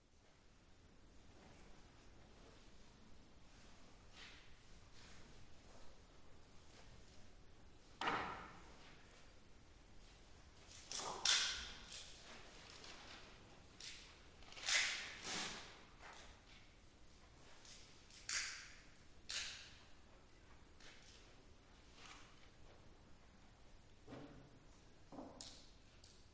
walnut cracking in a very quiet concert at the holywell music rooms oxford